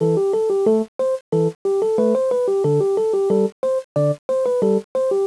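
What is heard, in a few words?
bgm electric piano; ゆめ2っき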